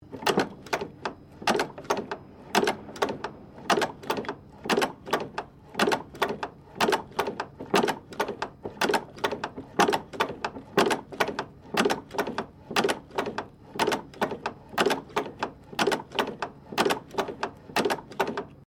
Band Saw Setter Manual Operating Loop; Classic, Historical, Old, Antique

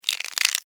Insect Move Click, Insect, Movement, Clicks, Bug; Sound Effects:Animals:Insects; Blastwave

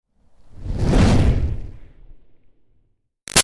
Fireball Whoosh Flamey Huge; Sound Design; BigRoomSound

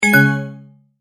multimedia tone error; Tones; Sound Design

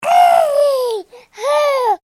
Baby Happy Vocalization; human baby 3 months happy vocal; Voice

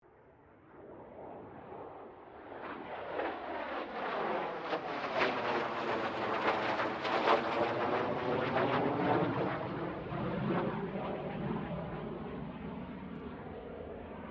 AIRCRAFT CA-27 SABRE PASS STEREO

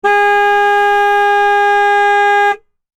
Vehicle Auto Horn Long Honk Beep Automobile Car; Cars; Vehicles

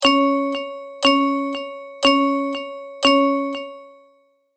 Multimedia Tone-Thinking/Processing

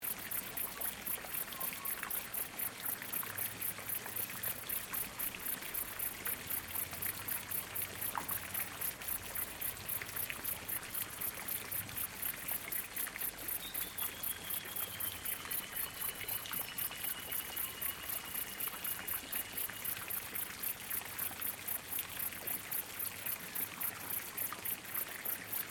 Ambience Victoria Insects Waterflow; Outdoor Sound Effects; Outdoors (Sound Effects:Backgrounds); Foundation1

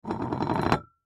Plate Wobble Dish; Household; Dishware; Blastwave

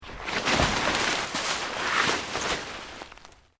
Plant Leaves Large Move Slow; Material components for constructing more complex sounds; Rustling

